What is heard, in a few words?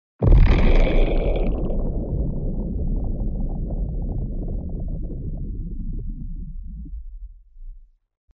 under water explosion